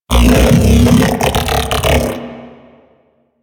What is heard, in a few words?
Mech Ambient Vocal